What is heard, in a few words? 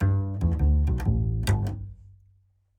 bumper bass